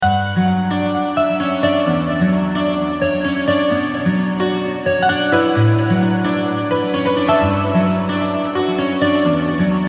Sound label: romantic guitar ringtone